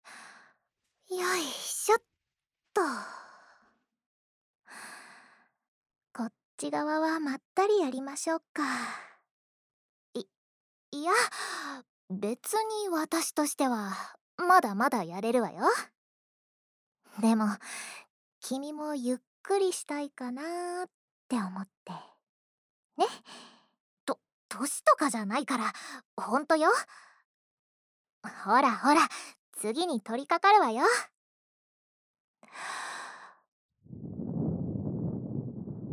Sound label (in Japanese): 耳吹き, 左耳; 後日談